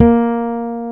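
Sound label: fretless bass A3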